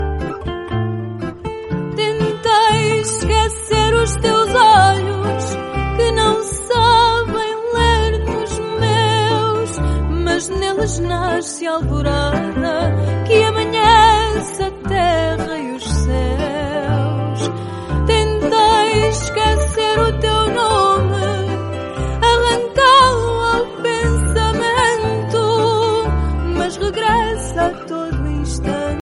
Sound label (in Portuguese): fado